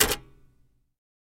Typewriter Back Spa; typewriters

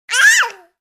Baby: Happy Yell

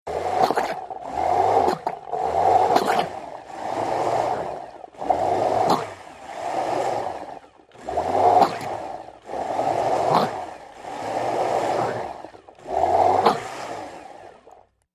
Blender Slw Pulse Ver

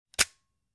Shotgun Dry Fire; mvmt

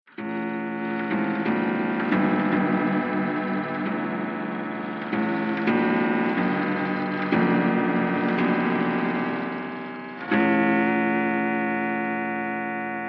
Weird Clock Strikes, bell

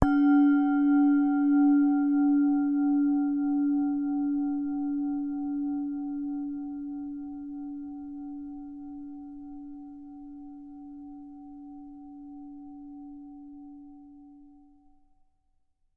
Tibetan Bell Strike, bells

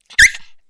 Dog Maltese Whine Sgl

